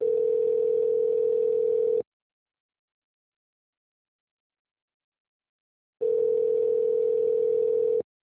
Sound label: Ringing 2 rings